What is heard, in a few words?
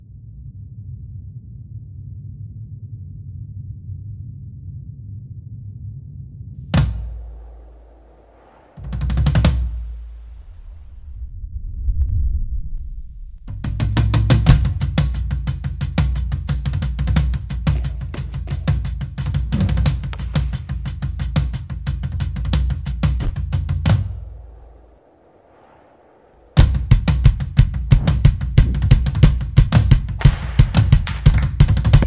drums